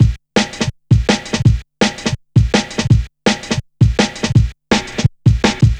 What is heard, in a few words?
Check A, Jungle, Drum Loops 165.5; Zero-G Jungle Warfare 1, 2 & 3